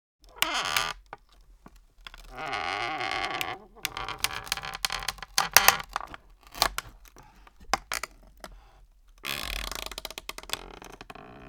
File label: Wooden chair small creaks of movement